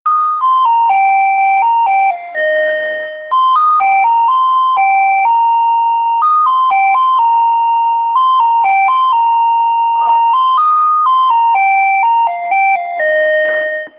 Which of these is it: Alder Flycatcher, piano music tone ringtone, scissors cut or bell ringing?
bell ringing